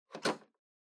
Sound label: TV Hardware Card Unplug